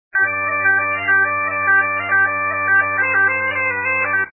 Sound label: bagpipes; maya miguel global groovin